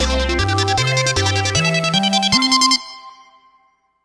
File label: Sonic Ringer